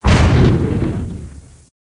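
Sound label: explosion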